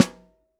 Snare Top; Ableton Foundation FreePacks; Snare Designer

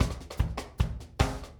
150 Ska Drums; Pop Rock

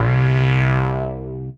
Sodapop, Analog Bass; Ableton; Retro Synths; Analog Bass Vol 2

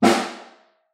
Far, Snare

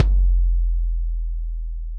KICK ROTO 18; Ableton; Factory Packs; Drum Booth

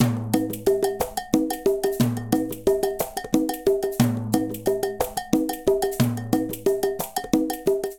Afro Cuban 120bpm, Latin Percussion; Ableton; Factory Packs

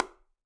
Bongo Rim; Bongo B H Rim; Latin Percussion; Drums; Drum Hits; Ableton; Factory Packs

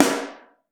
Snare Mono

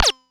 squeezy ricochet; Drums; Ableton Foundation FreePacks

